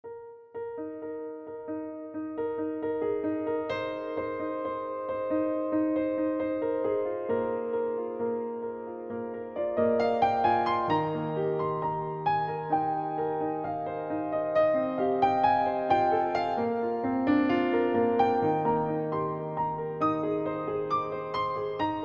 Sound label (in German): Klavier Version; Alle Jahre wieder